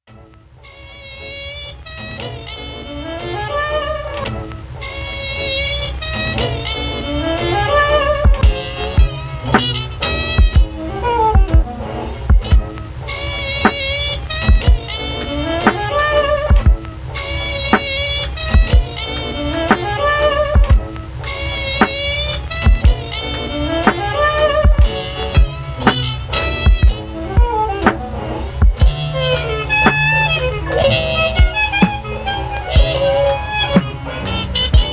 jazz

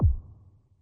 BANG

